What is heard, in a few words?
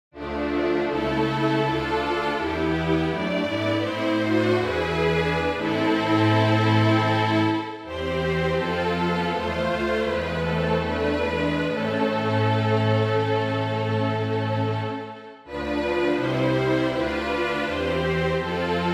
Strings Zarlino; Bach 325